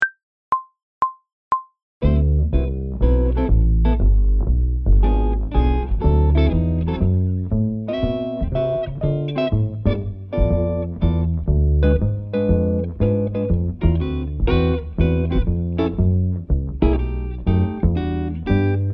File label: Swing 120bpm, Brushes; Phrasing and Freedom with Brushes